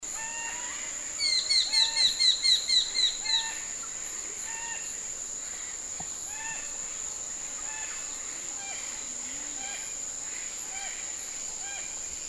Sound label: new britain kingfisher